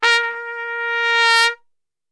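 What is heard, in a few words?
A#2 trumpet; Best Service; Brass Super Section; TRP SWL; 90 s Sample CDs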